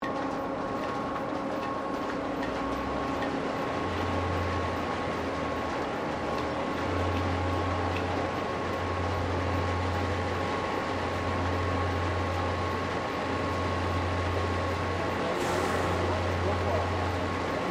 Wheelwright Sander Operating, Colonial era machinery